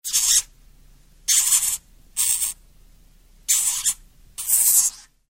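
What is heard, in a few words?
sounds of hamsters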